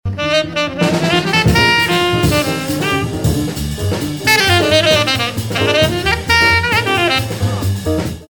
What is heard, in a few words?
sax